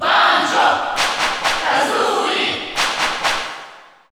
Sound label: Cheer Russian; Banjo & Kazooie; SSBU